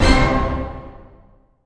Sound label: orchestra hit